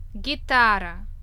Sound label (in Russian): gitara